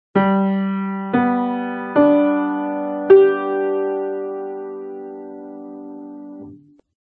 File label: Sol Mag arpeggio